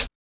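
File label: hi-hat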